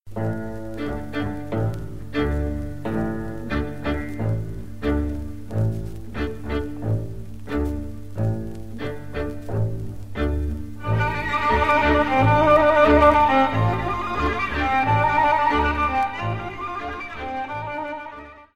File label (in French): Gaida - Roumanie